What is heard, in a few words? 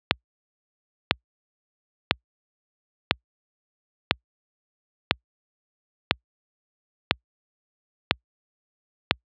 Impulse train BP